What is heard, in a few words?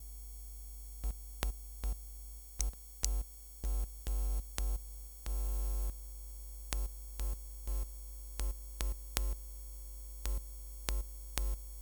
Piezo